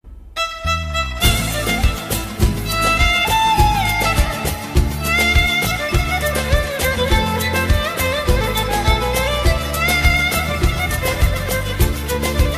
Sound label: Very Beautiful Arabic Ringtone; ringtones